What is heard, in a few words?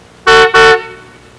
car Horn